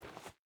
footstep hide dirt walk; Rust

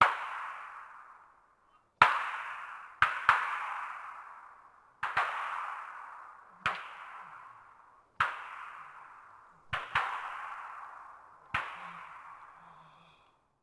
automatic, combat atmosphere

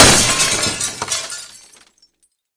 glass break big; Escape from Tarkov

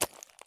walk slate; Escape from Tarkov

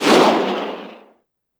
tarantula rocket

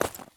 run slate; Escape from Tarkov

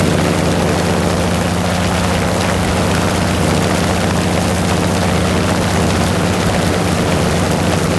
engine ai plane rpm 50 close; War Thunder; engines

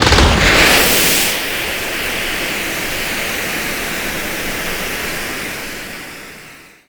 flares; buraq

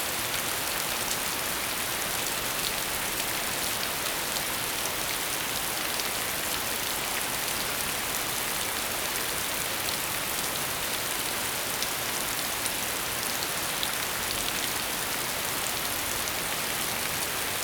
rain light 2d

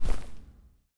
Footsteps Grass; DCS

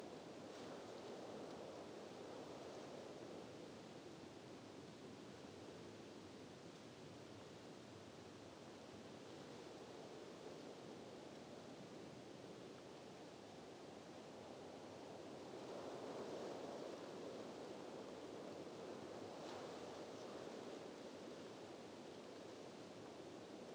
ambience beach calm; Rust

